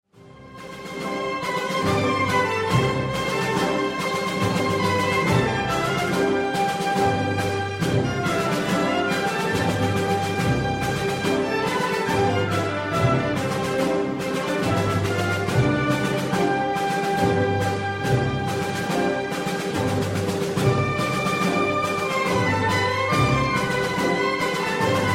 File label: bolero; ravelin